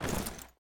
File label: body impact soft; physics